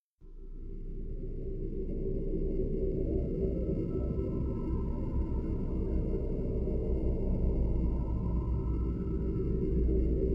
music cave

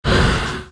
spin strike effect

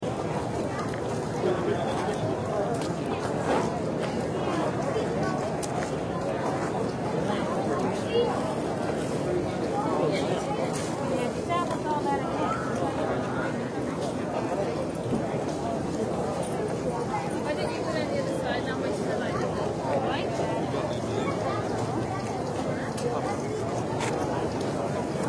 ambient distant crowds

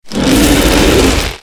chill king attack; mobs

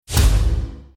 party invite

